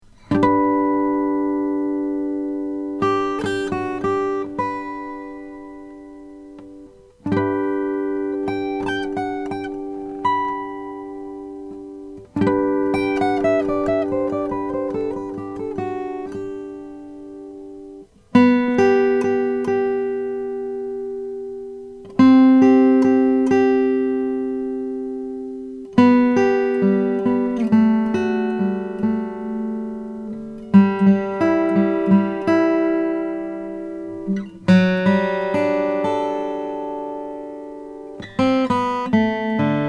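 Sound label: laurie williams signature kiwi whitebait, guitars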